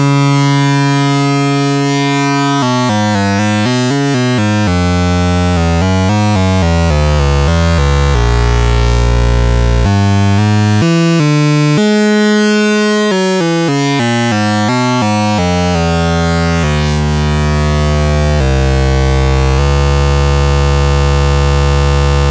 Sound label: cyclebox sqsaw sine